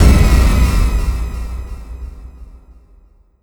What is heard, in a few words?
Stinger High, Cinematic Horror Sound FX; Sir Bedlam Productions; Bedlam Stingers